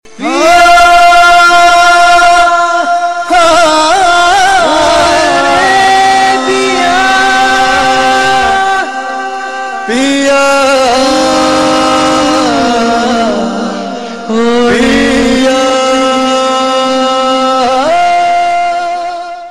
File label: Veena Tone